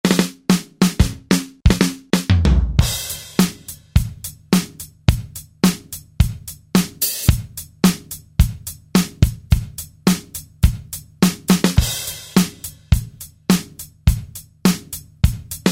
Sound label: Studio 70 Kit; Sonic Reality Modern Songwriter